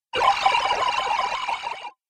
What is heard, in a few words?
Wacky Water Video Game